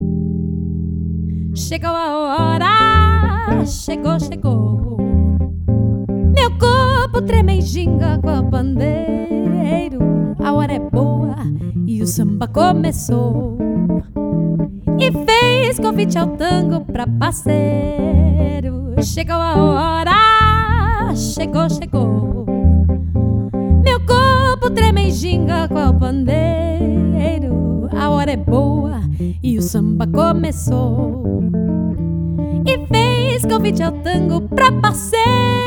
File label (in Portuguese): samba e o tango